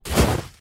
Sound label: water; eGravity; Speedy Boat